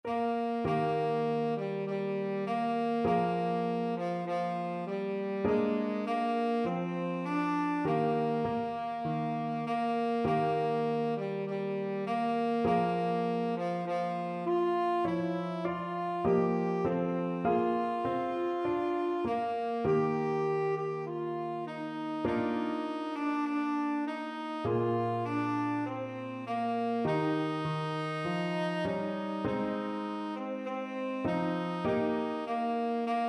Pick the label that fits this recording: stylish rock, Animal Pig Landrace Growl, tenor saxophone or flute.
tenor saxophone